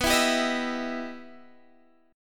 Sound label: E Minor Major 7th